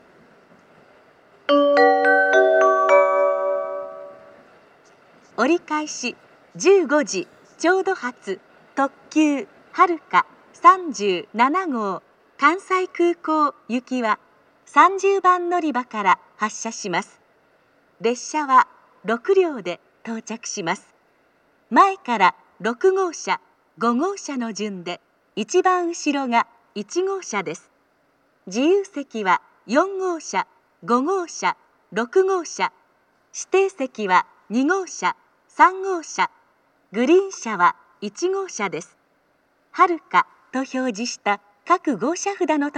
turn limited express, kyoto station; kansai-airport

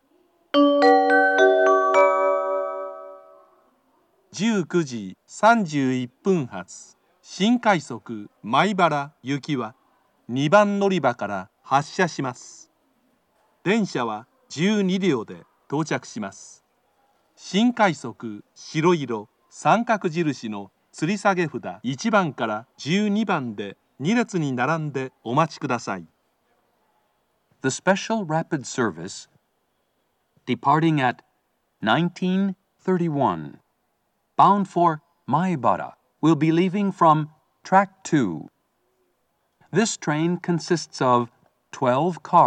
special rapid service, kyoto station; maibara; jr-west

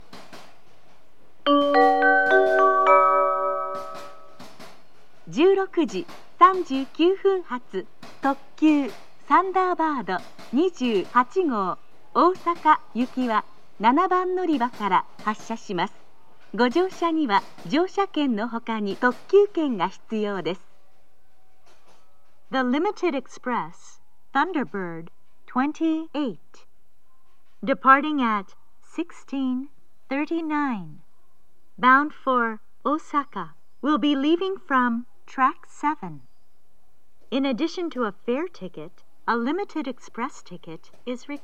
limited express, kyoto, station; thunderbird28; osaka

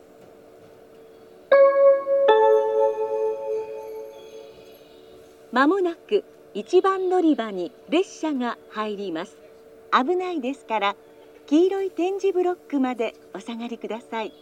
toyama turn local; station; jr-west; etchu-yatsuo

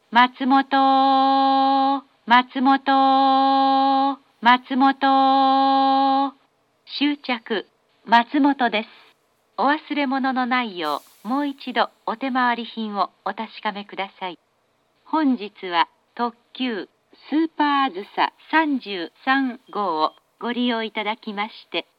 matsumoto stop limited express azusa, station; jr-east

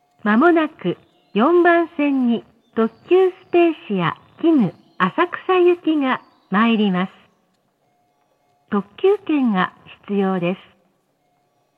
limited express, station; kita-senju; kinu; tobu

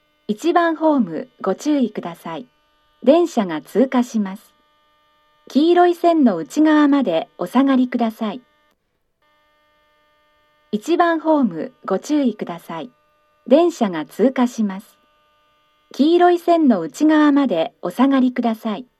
hana-koganei pass, seibu station

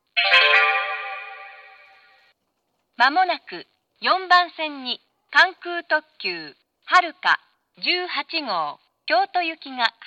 kansai airport limited express, station; haruka18; kyoto

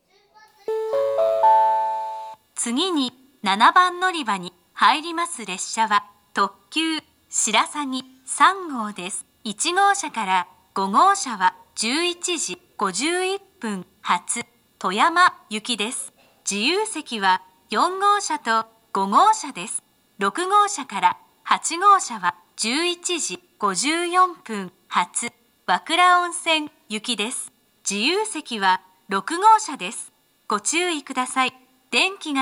kanazawa limited express; shirasagi3; toyama; wakura-onsen; jr-west

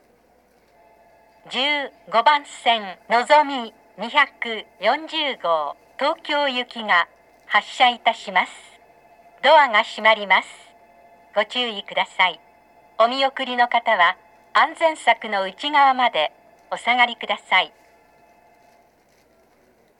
nagoya s nozomi tokyo; jr-central

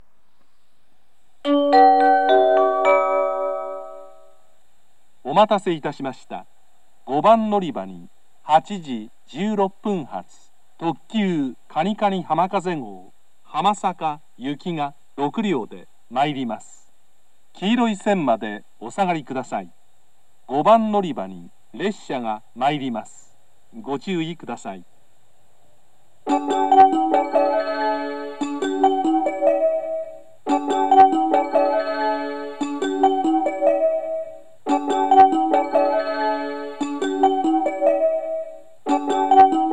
kobe limited express hamasaka; kanikani-hamakaze

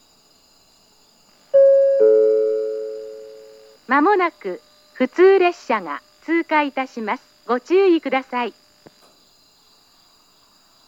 kuroi pass local, station; jr-east

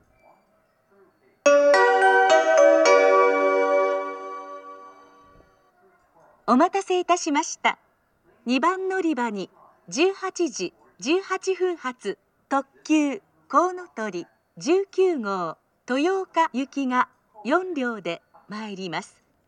amagasaki limited express, station; toyooka; jr-west; kounotori19

